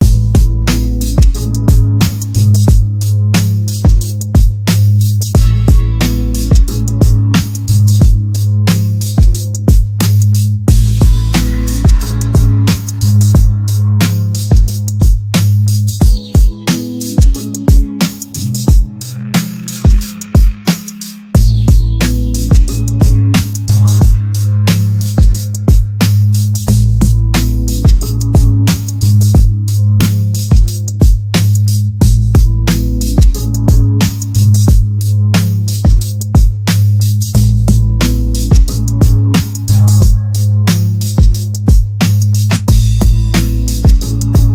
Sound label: Chillhop Mellow